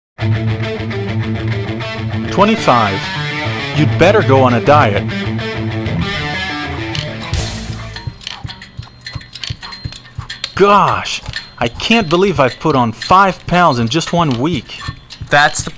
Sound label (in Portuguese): Trilha